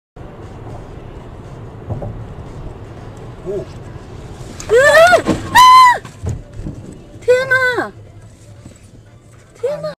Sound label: crash